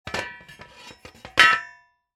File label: METAL DROP